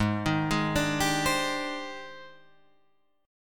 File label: G sharp Major Sharp 11th, chord